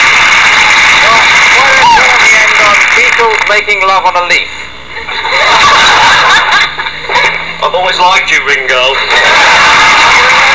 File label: beetle